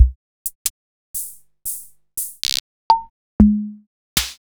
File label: Keio Checkmate